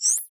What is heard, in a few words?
Monster; Zenless Zone Zero